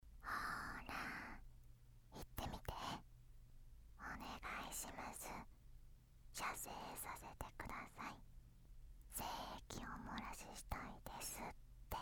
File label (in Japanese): 手コキ・耳舐め ノイズ未除去; 童貞先生とエッチなおしえごJK